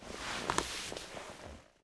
z auta vysed; Mafie 1

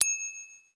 cink; Mafie 1

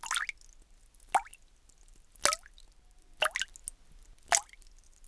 kapani; Mafie 1

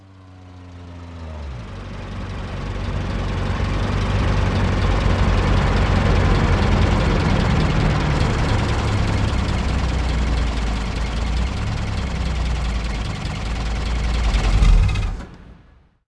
auto; Mafie 1

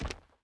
zacatek psani; Mafie 1

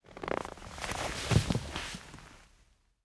auto vrneni; Mafie 1

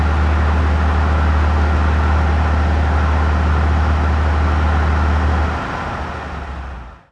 auto; Mafie 1

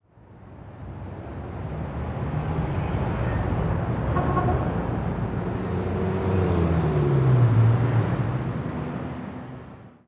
in autko; Mafie 1

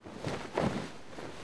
noha na nohu; Mafie 1

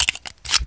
nabíjení; Mafie 1

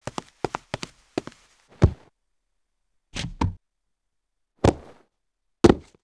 padá; Mafie 1

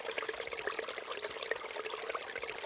horlavina; Mafie 1

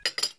salek; Mafie 1

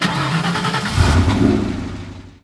auto start; Mafie 1

